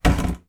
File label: household watering can plastic empty down on hard floor